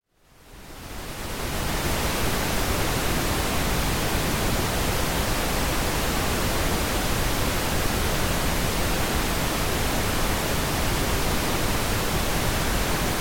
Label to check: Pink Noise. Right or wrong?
Right